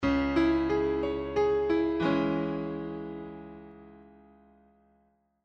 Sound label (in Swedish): Tonartsgivare Cm Dbm